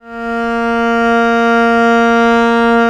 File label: A#2, VIOLE, Historical Instruments; USB Soundscan vol.23